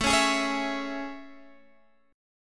A Major 7th A

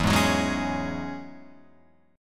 E 6th Add 9th E, Guitar Open F; strummed; chord

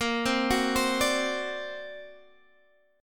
D Augmented 7th, chord

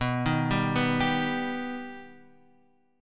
B Augmented B down Guitar Drop D

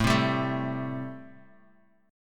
A-Minor 7th A Guitar; strummed; Am7

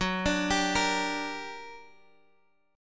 G Minor Major 7th F sharp

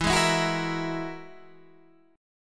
Ab 7th Suspended 2nd Sharp 5th, E

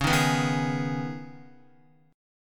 Db 7th Suspended 2nd Guitar, Db7sus2 chord; JGuitar

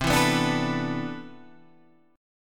C Major 9th Add 13th, guitar chord

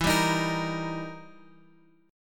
E Minor Major 7th Flat 5th, Guitar, Open F; strummed; EmM7b5; 16,14,15,17,x,x

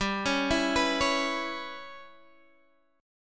G 6th Flat 5th; G6b5 arpeggiated; 15,16,14,16,14,x

